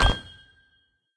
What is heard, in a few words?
mammoth hit meter; Candy Crush Friends